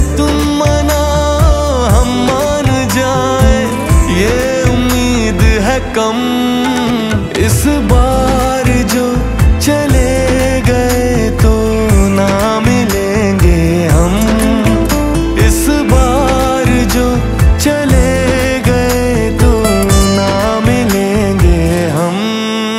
Ringtone

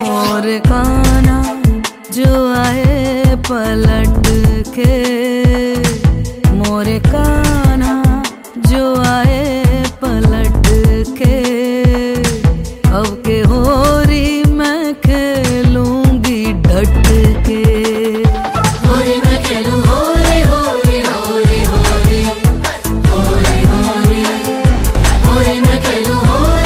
Holi Song

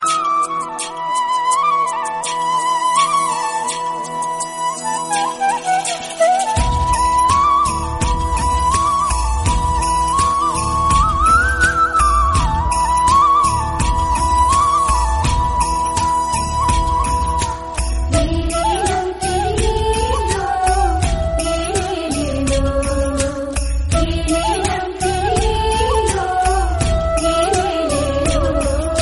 Flute Ringtone

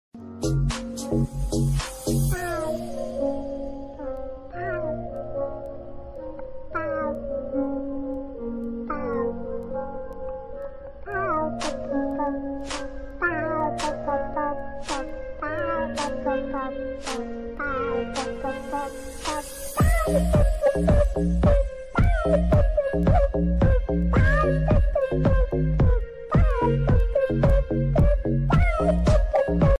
Instrumental Version Cat Remix Ringtone; tiktok

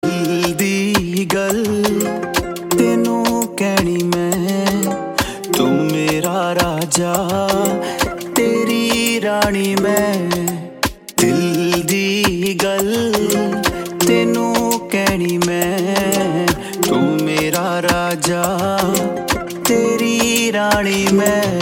Song Ringtone

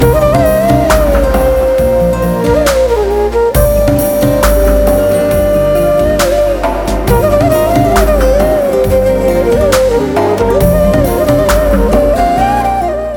Flute

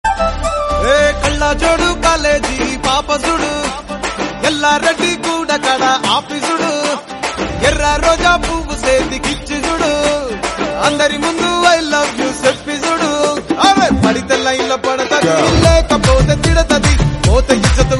Ringtone

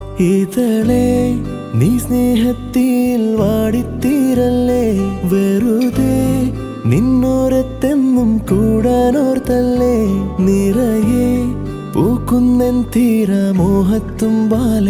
Ringtone

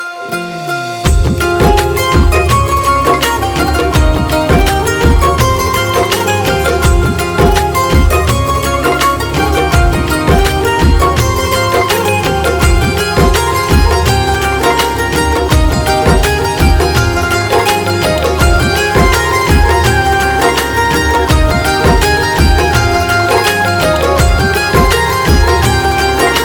Instrumental Ringtone

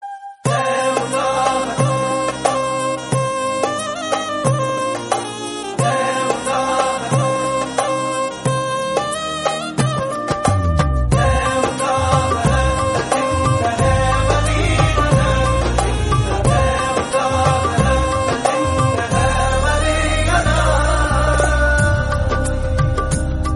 ringtone

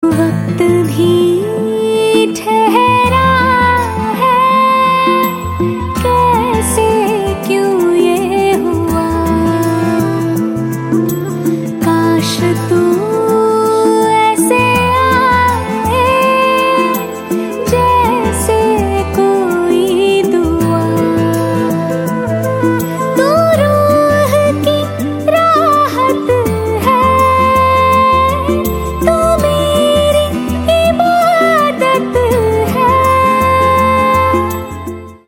Female

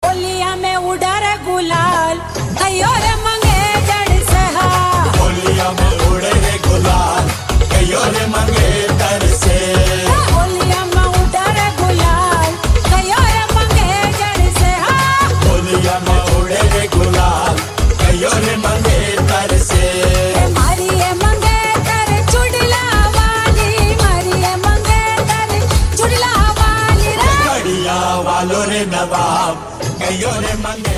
Carnival Mix

